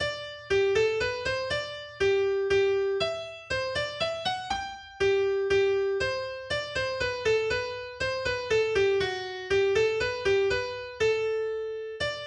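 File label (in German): Minuet in G Solo Akustikgitarre; Petzold; AkGit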